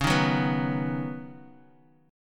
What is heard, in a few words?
C sharp 7th Suspended 2nd Suspended 4th C sharp Bass Standard; strummed; chord; 21,18,16,16